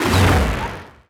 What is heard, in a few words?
boss death; horizontalrunner